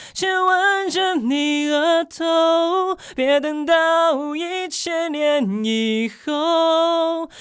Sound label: Tenor